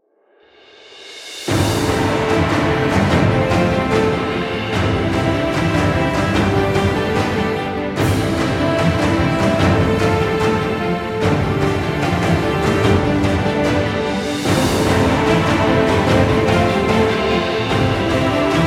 Dramatic Epic Epic Music

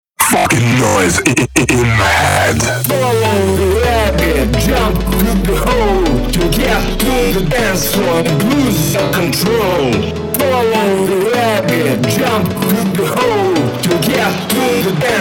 Noise, EDM

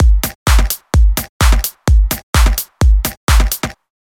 Drum loop (techno); Kid Studio

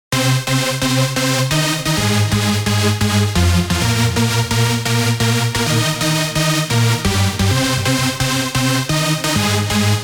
Mega Lead

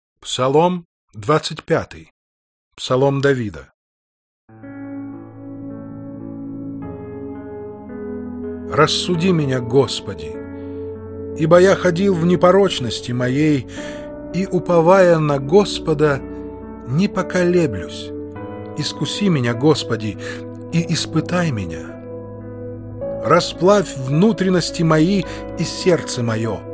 instrumental mix; ПСАЛТИРЬ, псалом 25, синодальный перевод

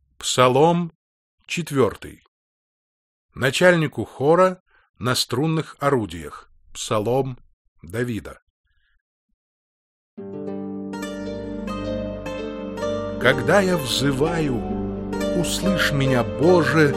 instrumental mix; ПСАЛТИРЬ, псалом 4, синодальный перевод

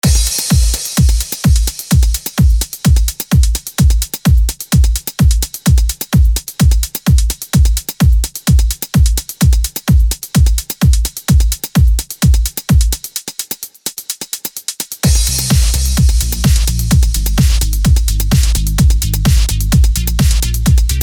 Electro remix

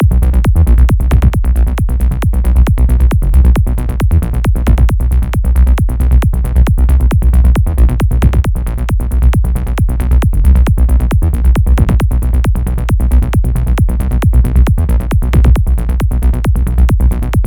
Psy trance D&B more bass; Infected Cat

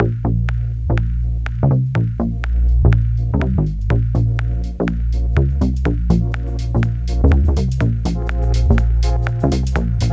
deep loop

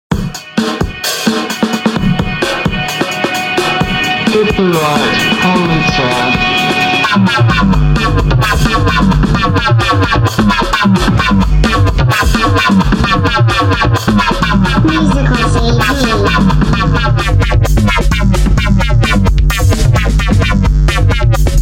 Musical CV pipes

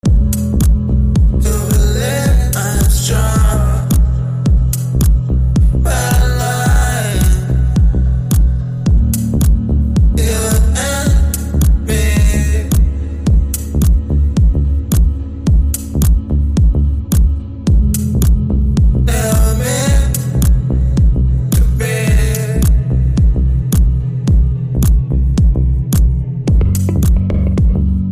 deep house

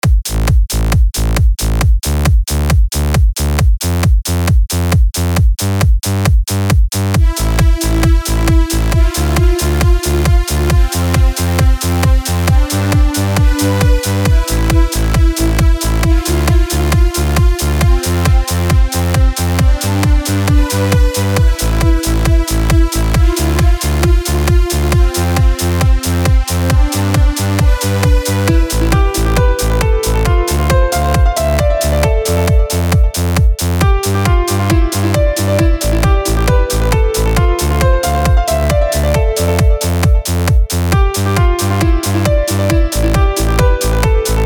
trance

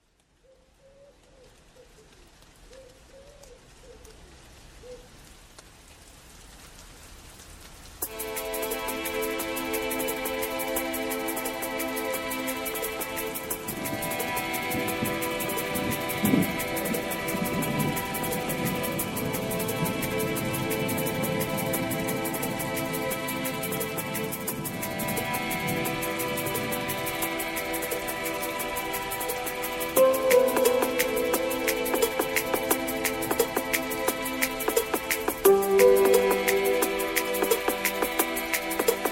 (SAX Mix)

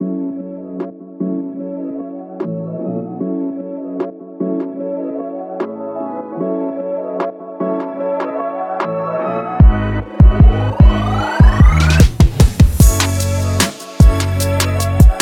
Future Bass Hip Hop

